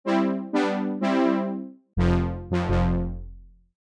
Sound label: Brass; opxpro2; Riddle